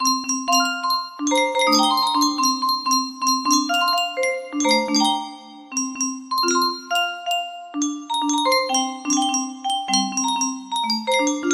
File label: melody